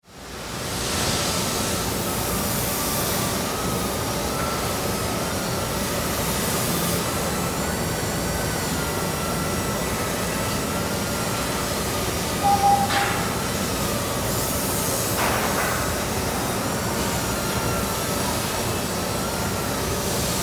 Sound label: Ambience Factory Heavy Activity Industry